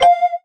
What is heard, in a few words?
Combo